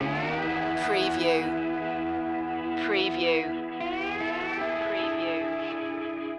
bikers blues loop

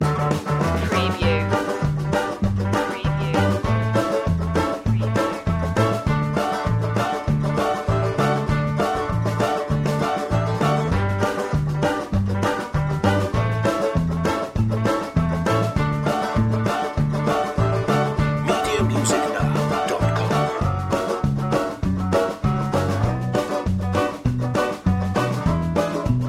jig underscore

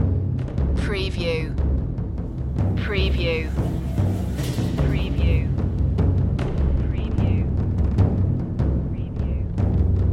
taiko triads loop

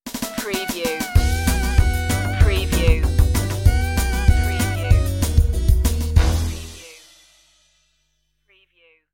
the spooky pumpkin head stinger

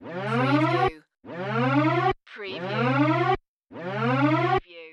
Scifi Siren loop

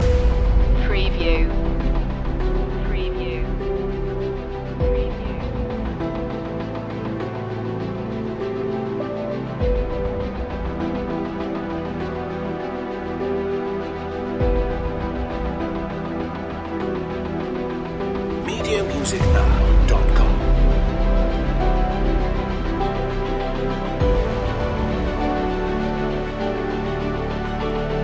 building drama loop

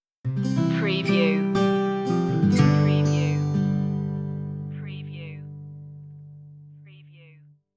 christmas spirit stinger

